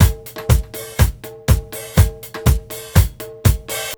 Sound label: BEAT, Roots Disco; USB Soundscan vol.32; AKAI